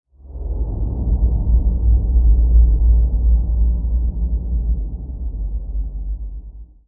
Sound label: air buzz